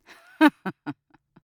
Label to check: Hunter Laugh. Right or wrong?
Right